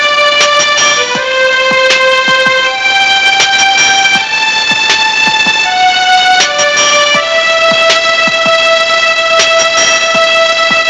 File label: perfect music; cstrike; ambience